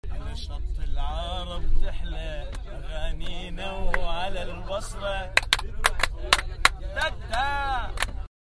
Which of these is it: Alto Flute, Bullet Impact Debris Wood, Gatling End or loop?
loop